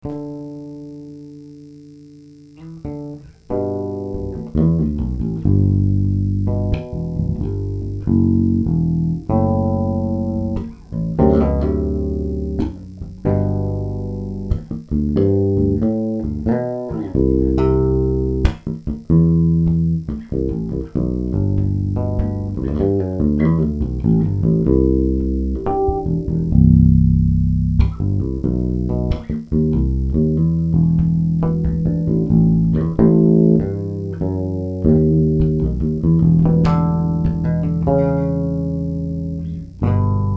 bass

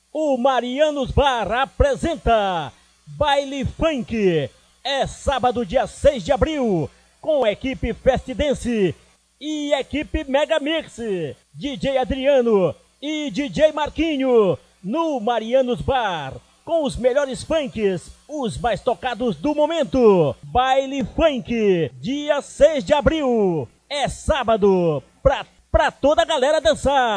baile funk

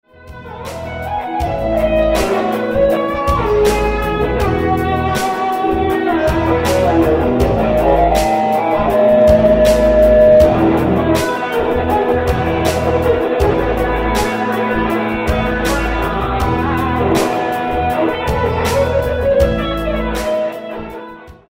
romantic

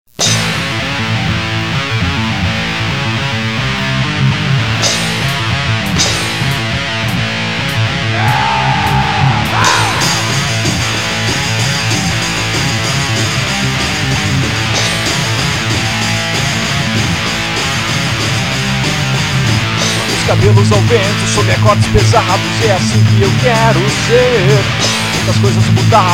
heavy metal

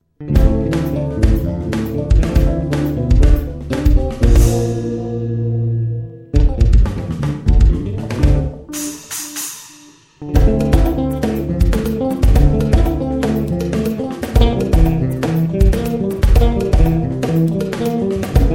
hot pace ritmo quente

